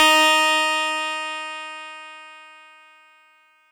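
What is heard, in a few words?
Fuzz Clav DX100 D#0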